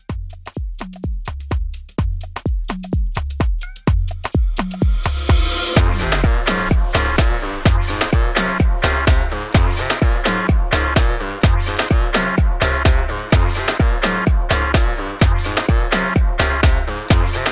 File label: Afro, 127